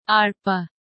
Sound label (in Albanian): arpa